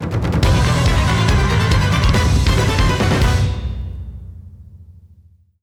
Win Jingle; Paper Mario The Thousand-Year Door

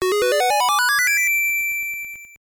Jingle; Sylvanian Families 2 Irozuku Mori no Fantasy 2000; GBC

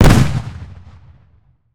Explosion; Stumble Guys; Android

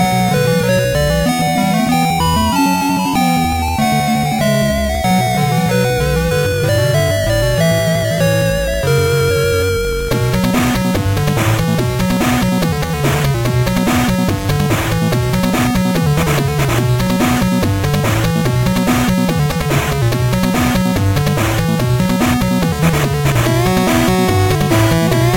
Jungle Music; timothy and the mysterious forest ps4 switch windows gamerip 2019

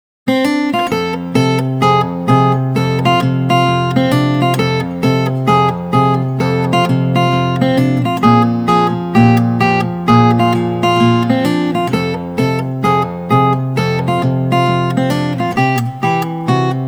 Boogie-Woogie, easy solo guitar; Dragon Quest Solo Guitar Song Collection

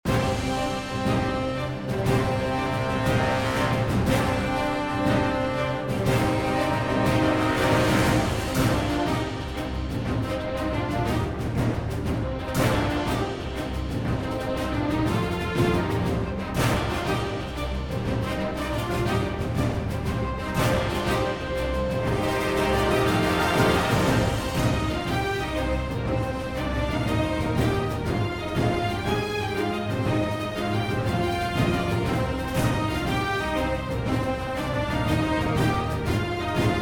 Background Music; Brave

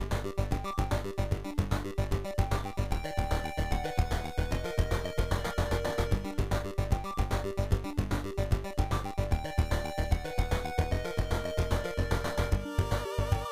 Boss fight; Suzy Cube

